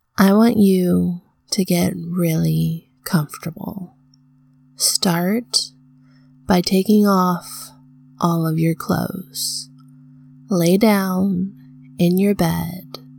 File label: oral noises, F; [F4F] Let me taste you; [HFO]; [beautiful]